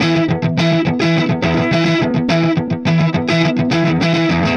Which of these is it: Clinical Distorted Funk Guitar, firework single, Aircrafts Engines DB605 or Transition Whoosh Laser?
Clinical Distorted Funk Guitar